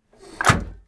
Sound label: Vehicle Hatches; Red Orchestra 41-45